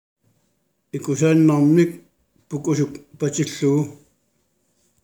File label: Vocal